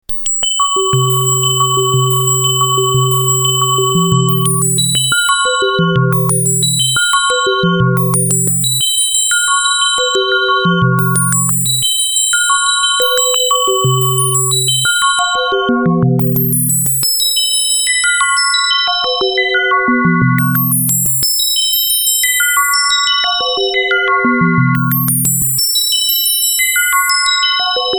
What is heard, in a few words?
Polysix